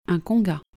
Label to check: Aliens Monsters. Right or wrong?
Wrong